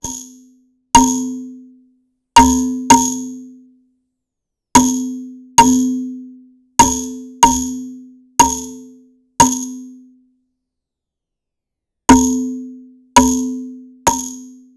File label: glass casing